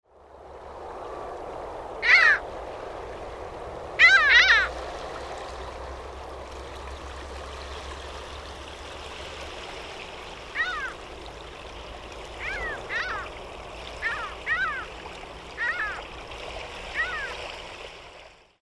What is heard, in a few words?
mediterranean gull